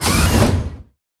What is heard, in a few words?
close fast, doors futuristic